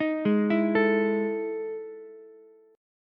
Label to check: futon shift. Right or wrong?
Wrong